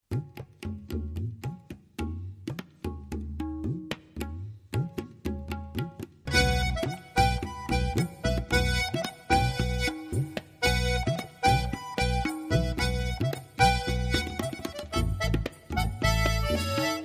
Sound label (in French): La Bourree